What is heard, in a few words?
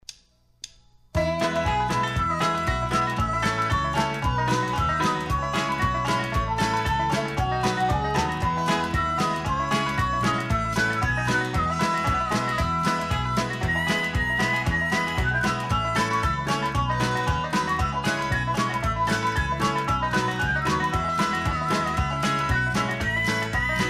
theme instrumental